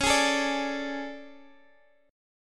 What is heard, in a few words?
A Major 11th, guitar